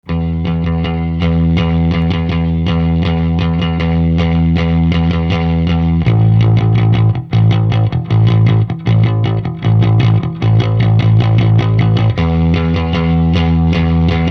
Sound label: hiwatt